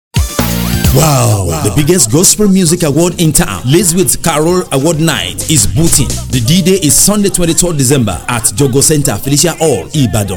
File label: JINGLE